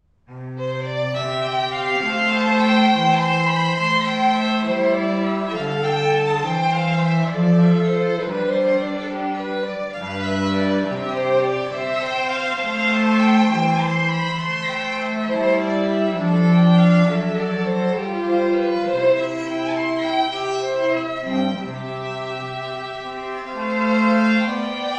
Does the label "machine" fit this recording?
No